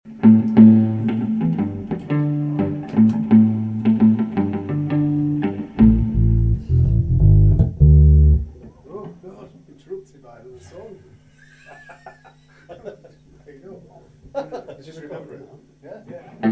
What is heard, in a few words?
New Riff Before Shake Up